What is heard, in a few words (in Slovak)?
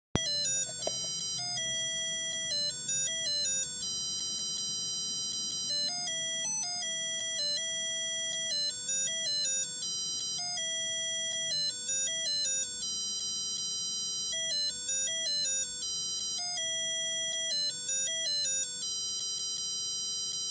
ukazka zvuku alarmu